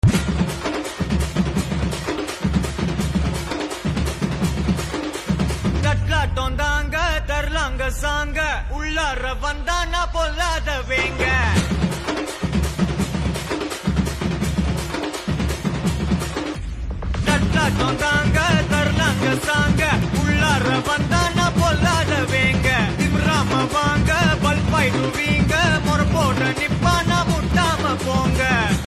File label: Ringtone with Drum Beats